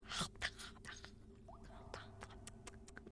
eating

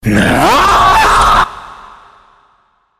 hhhhheeeeeaaahhhhhhhhhh

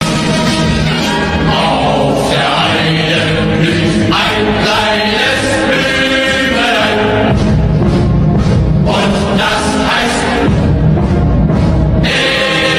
auuuuuuuuuuuuuuuug

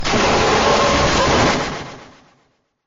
jumpscare sound; corruptus oblitus casa

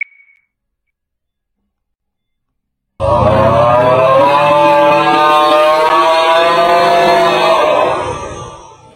augghhh

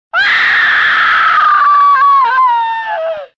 impaled scream; deception; mileena

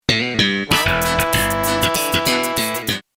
sitcom transition bass riff; seinfeld

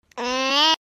bab hit head

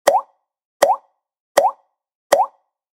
water drop; ohio; ahh

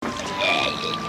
burp

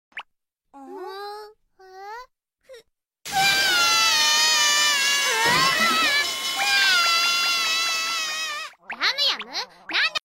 crying

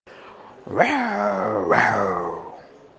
lions

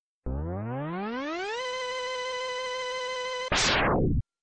charged buster shot; mega man x

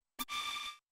messenger buzz; yahoo

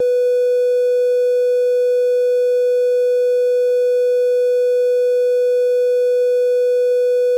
beep loop; tenna

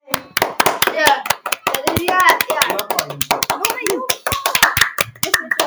clap

